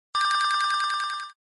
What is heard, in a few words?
ring notification